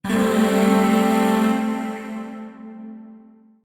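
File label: Void Shawzin Major Chord; Warframe; Zariman; Chords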